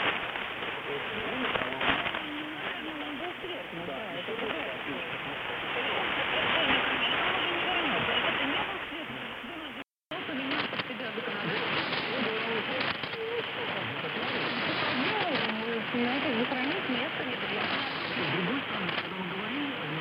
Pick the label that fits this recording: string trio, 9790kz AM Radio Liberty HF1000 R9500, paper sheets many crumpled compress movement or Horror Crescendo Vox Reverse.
9790kz AM Radio Liberty HF1000 R9500